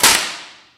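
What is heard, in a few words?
physics clipboard impact large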